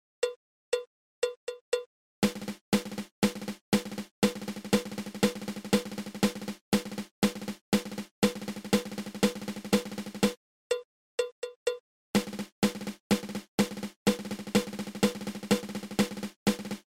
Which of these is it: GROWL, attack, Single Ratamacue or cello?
Single Ratamacue